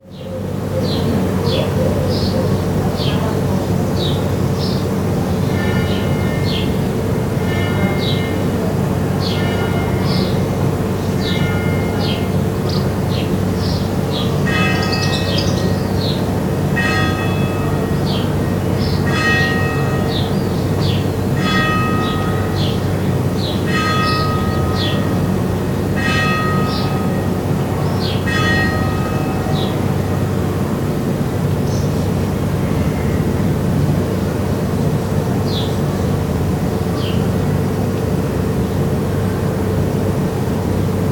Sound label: Catalan town; field recordings